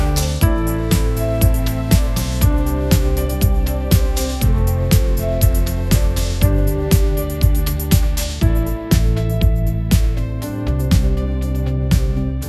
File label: upbeat pop melody, ringtones